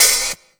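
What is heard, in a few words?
open hi-hat, Drum & Bass; Ueberschall; Drum & Bass Resonance; 155 BPM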